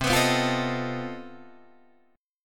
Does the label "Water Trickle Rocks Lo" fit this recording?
No